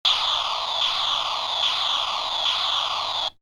Toy explosion, Toy, Small: Explosion Effect; Miscellaneous Toy; Stuart Duffield

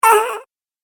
Cartoon Game Voice Pain Sound; Twistedtracks

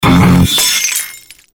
Stinger Glass Break Guitar; Stinger Glass Break Shatter Guitar Chug; Production Element Imaging Element Accent Transition

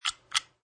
Lock

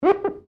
GLASS SQUEAK FINGER

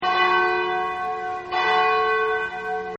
Church Bell; Bells; Musical

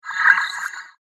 Alien Virus Checker Sweep, whoosh

